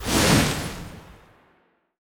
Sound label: Attack; Azeria